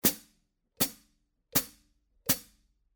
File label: new beat hh foot chicks